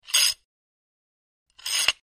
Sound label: Extending And Retracting A Steering Wheel Lock; The Club Lock